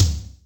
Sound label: BD ROOM, Yamaha DTXpress Acoustic Kick